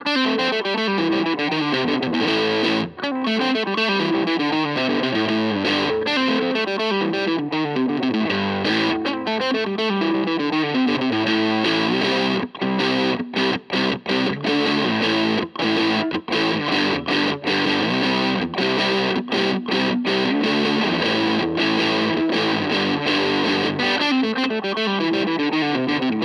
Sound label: Maybach Fuzz; Harley Benton Vergleich